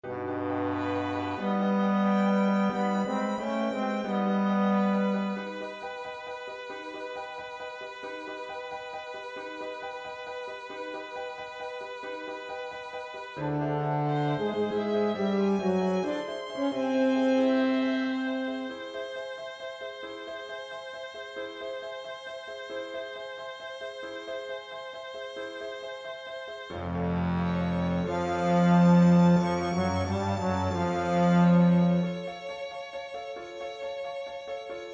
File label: Orch